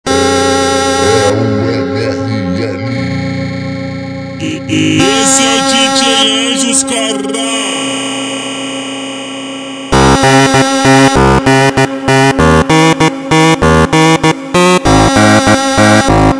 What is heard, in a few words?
instrumental super slowed